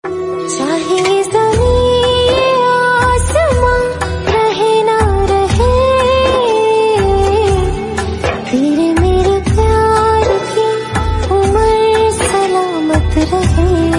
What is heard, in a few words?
Female Ringtone